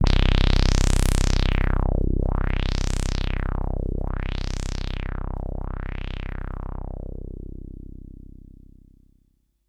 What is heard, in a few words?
SYNTH Low